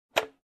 Overhead Bin Closed Sound

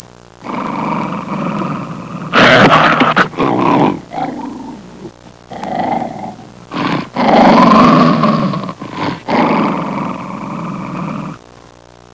Angry dog snarling sound

